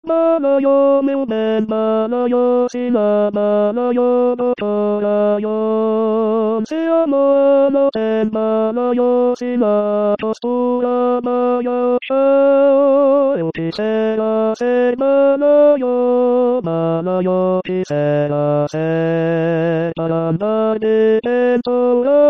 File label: Tenor; Balaio; Chant populaire Brésilien